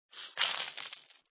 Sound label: coin; RoadToBillionTycoon